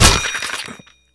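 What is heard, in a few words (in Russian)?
дребезжание прилетевшего в цель ножа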